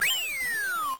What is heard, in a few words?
Goal SFX; SMA4